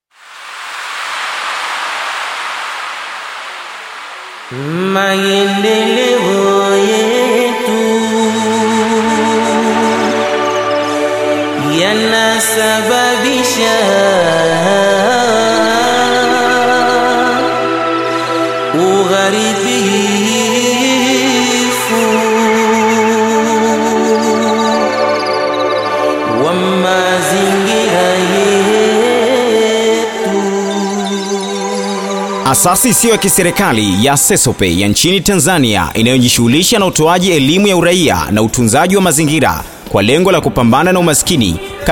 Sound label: JINGLE